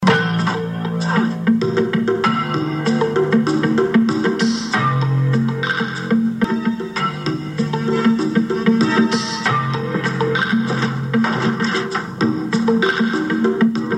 police theme

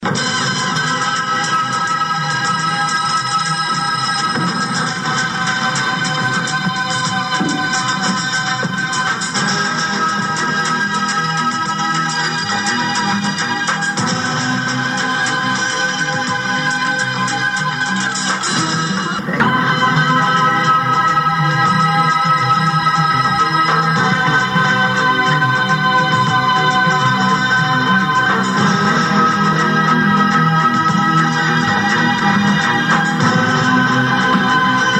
church theme

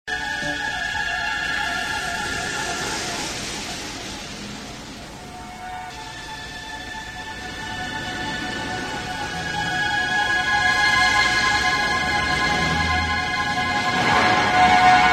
creepy theme

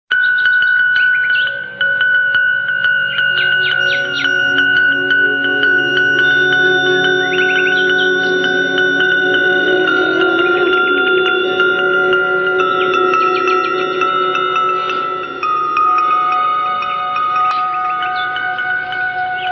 suspense theme

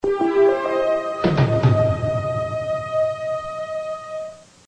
dramatic theme ender

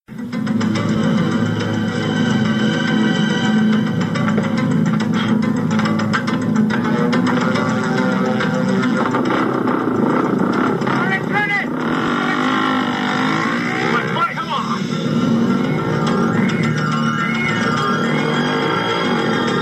action theme end

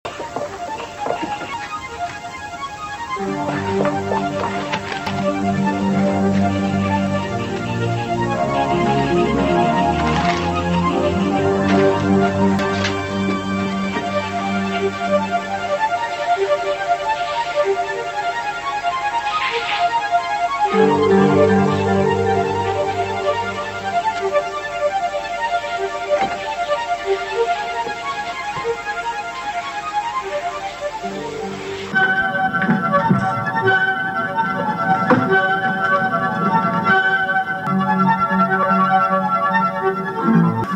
dramatic theme; victoria

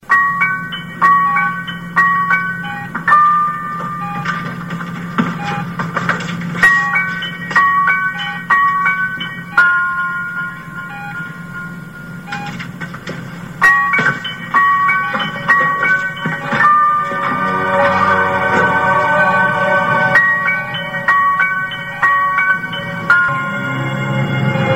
suspense theme

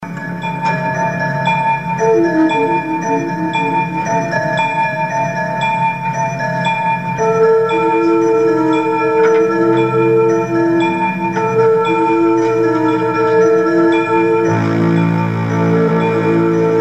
creepy

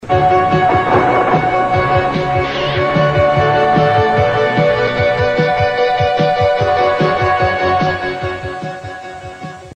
dramatic theme

